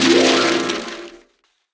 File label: gift smash; sfx